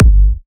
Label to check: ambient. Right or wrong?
Wrong